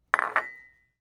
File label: Cup, Cooking night; Nikko Barrera-Amaya